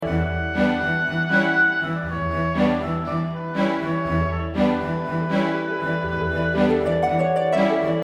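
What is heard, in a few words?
Short RPG, BGM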